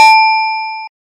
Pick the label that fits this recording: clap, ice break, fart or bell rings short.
bell rings short